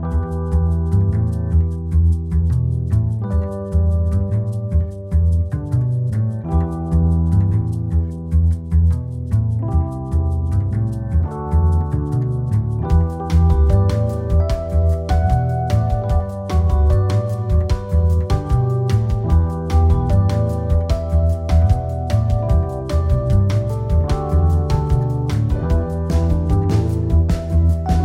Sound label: lobby music